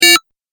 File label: Button Strong Beep Click; Click Sound Effects